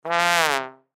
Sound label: Trombone Stab, Trombone Stab Lower; Cartoon; Musical